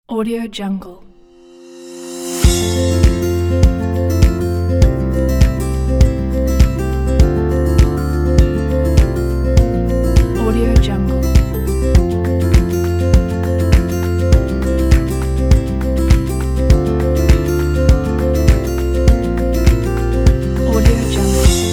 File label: guitar